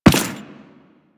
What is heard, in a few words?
Run Default, Footsteps; Warframe; Teshin